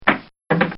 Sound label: Fall And Bang; Injuries; Combat